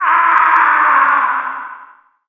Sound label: scream